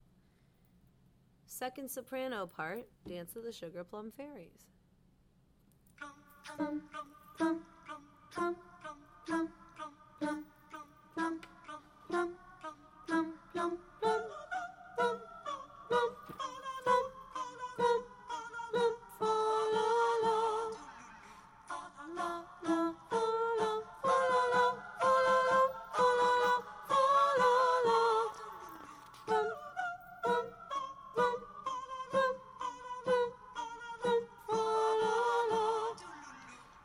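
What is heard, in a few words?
Dance Soprano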